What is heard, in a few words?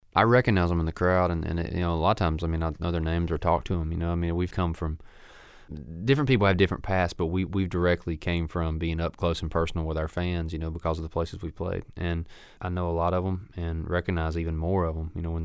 fans in crowd